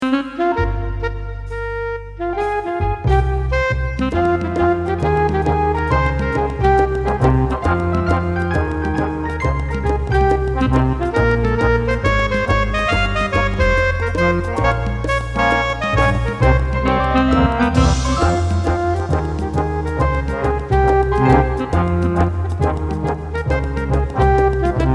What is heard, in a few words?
lyrical moves